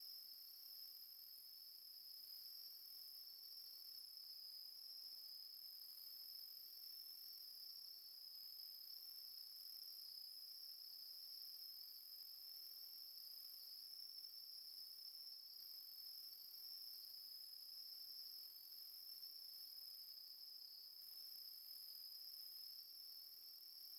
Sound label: ambience crickets; Rust